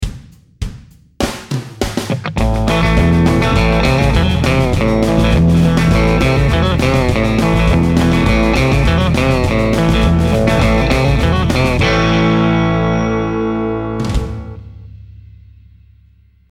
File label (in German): Country Licks Lick